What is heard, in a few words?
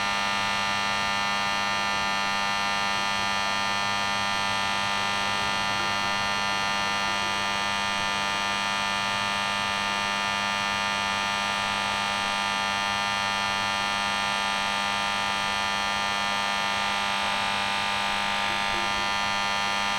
Electrical Hum